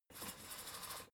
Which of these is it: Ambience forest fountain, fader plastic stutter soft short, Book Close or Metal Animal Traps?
fader plastic stutter soft short